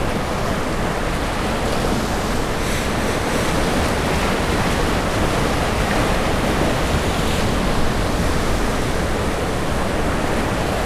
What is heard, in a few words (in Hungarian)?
szikláknál, óceán; orzola